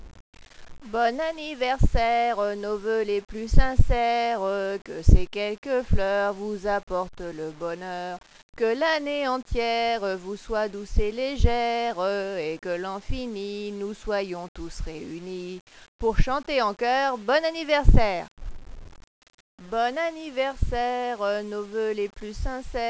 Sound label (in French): chanson d anniversaire, midi